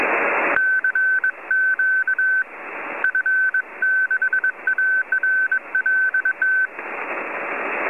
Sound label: Morse code